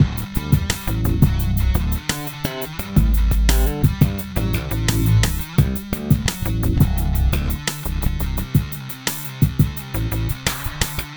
Call, Hip-Hop; Sonic Foundry (Sony Creative Software); Hydroponic Hip-Hop; Combo

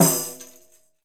Tambourine Skin Hit; Essential Percussion

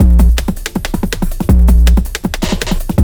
JUNGL 160, JUNGLE LOOP

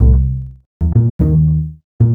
Standup Bass, Drum N Bass

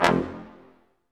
BRS Quintet staccatos, Symphony Orchestra; Roland LCDP08

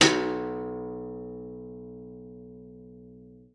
B1, GUITAR; Xsample VOL-2

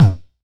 Hammer Mid Tong, Kicks; Airwindows

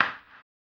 HAMBONE; ILIO; Vocal Planet VOL-2; Blues & Soul

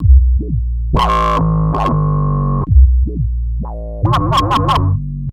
Future Bass; Drum & Bass; Fluid Dynamics

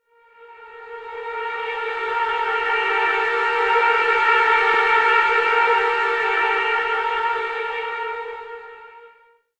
Trombone Effect, effected trombone

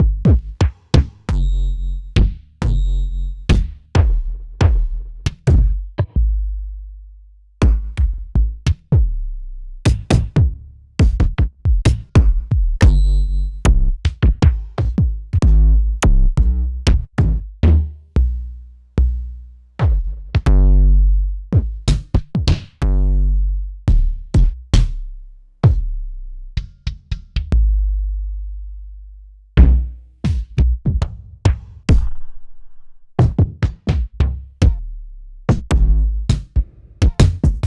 Akai XR-20 Kick

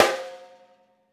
Pork Pie Snare; Essential Percussion

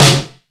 All Snares, E-MU Pro-Cussion; drum machine

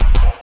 Zappy Kick; Sonic Foundry (Sony Creative Software); Esoterik Beatz; Zappy Kit

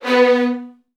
B1, VN 16TH, Virtuoso Strings; ILIO; Kirk Hunter

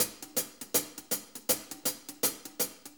Shuffle HiHats; Sonic Foundry (Sony Creative Software) - Loops & Samples Drum Tools; Shuffles

